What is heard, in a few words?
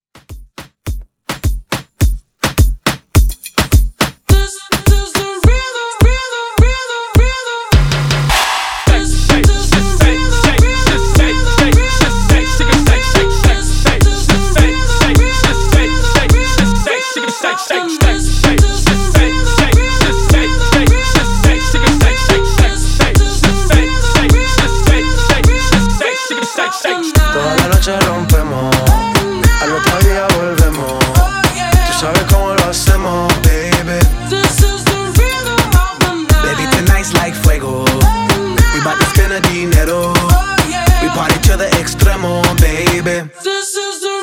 Hype Redrum 105 Bpm